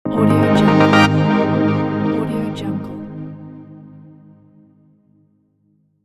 lush dreamy opening logo; slavadyadyun

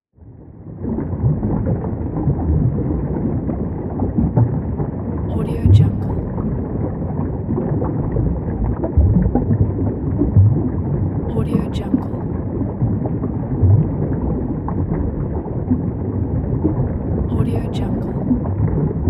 under water

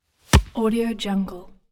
soccer kick

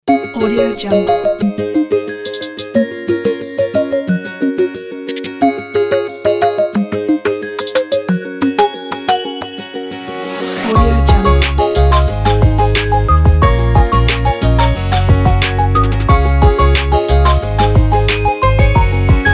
kids vlog opener

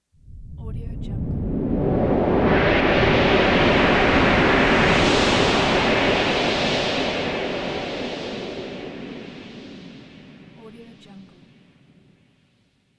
sci fi fly by

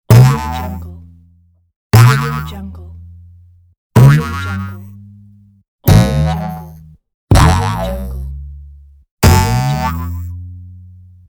boing

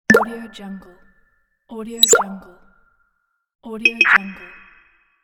mail message

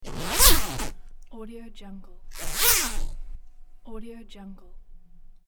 the sound of zippers on clothing

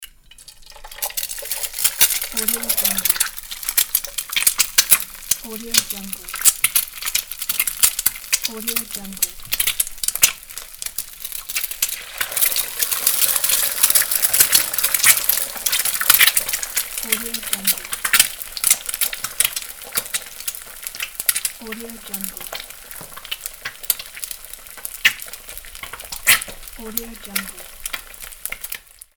frying eggs

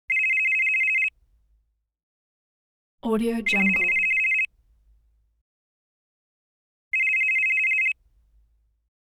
cell phone ring

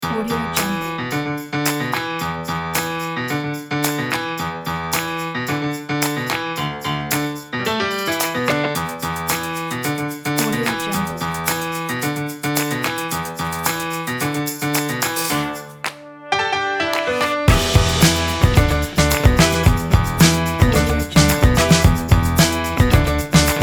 funk groove funky fun kitchen kit

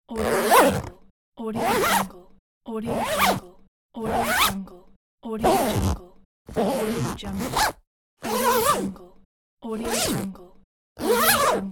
zipper

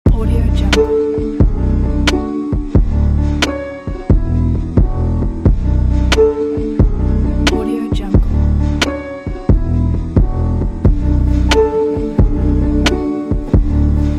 background vlog

